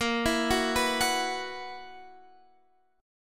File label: B Major 7th Suspended 4th Bb